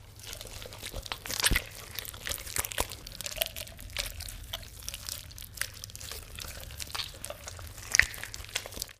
Horror Slimy Flesh Worm, slime

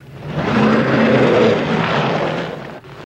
Car Tires Spin On Ice

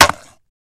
Staff Wood Hit Sml

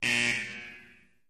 Ice Hockey Arena Buzz

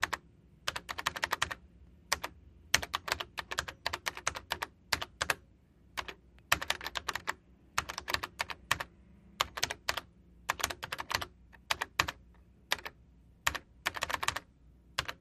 Typing Computer Elec

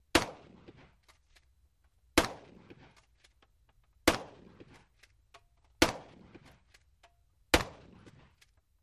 12 guage Shotgun Quic, shots

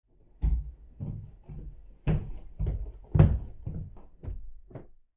Footsteps; Male Walking, From Next Room.

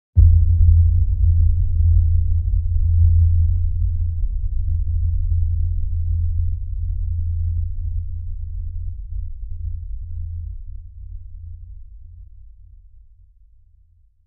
Hit Low Underwater, hit

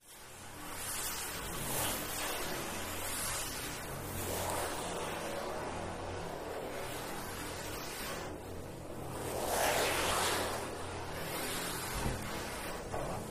Drum Metal Scrape, metal groan atmos

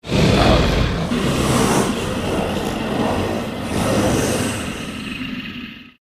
Monster Combine, monsters

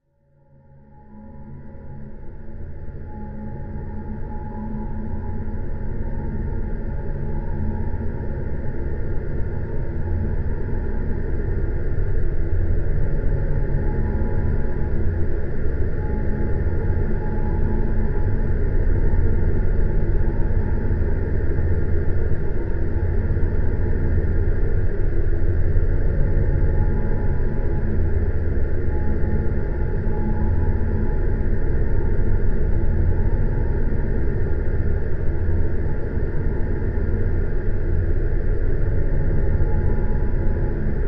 Music Eerie Horror, choirs

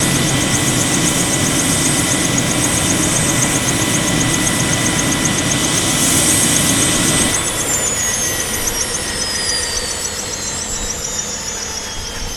Heli Wind Down Off, bell 212

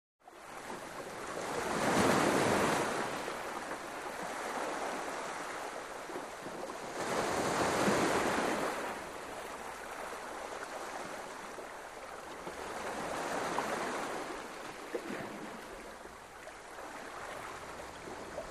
Waves Medium To Small

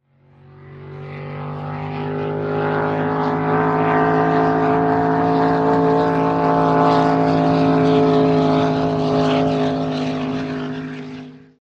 Drag Boat By Cu Race

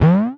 interface button, clicks

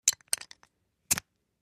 Beer Bottle Opn Opner

